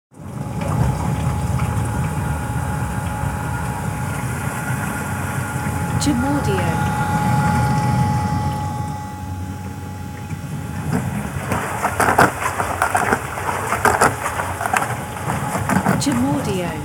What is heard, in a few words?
Dishwasher